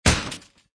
Rocket poof; Chicken Gun Rooster Rudy; iOS, Android

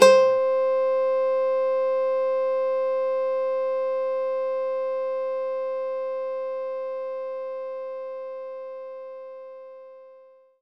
Nylon Guitar C4

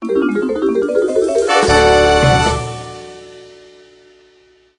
Jackpot Reward

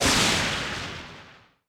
Lightning

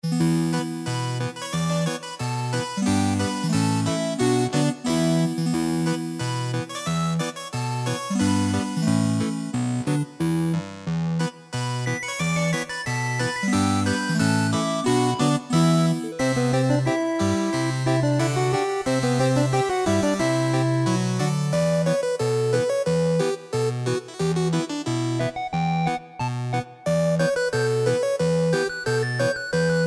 Tavern; Knights of Pen and Paper II

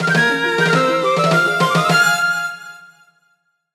death; donkey kong country 2 fan remastered; Klomp's Romp

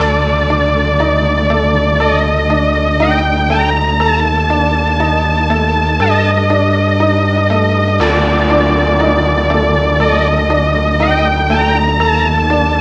Phone Game Music; Spookware

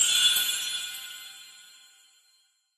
Frog Infuser Crystal Charged

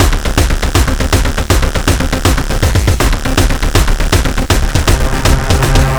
Beats Loop; Anger Foot

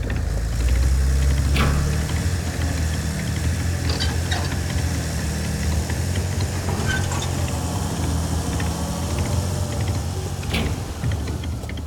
Bulldozer Loop

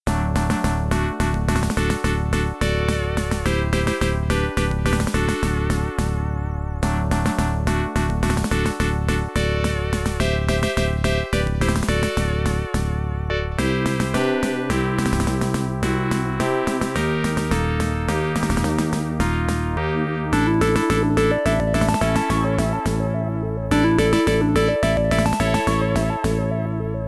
Congratulations (Adlib); Lollypop; MS-DOS

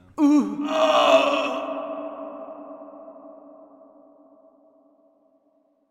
Voices Scream; Peggars

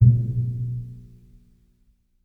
SFX door opened; The Detail ios linux macos windows gamerip 2014

